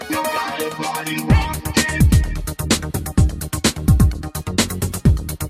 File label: Break Dance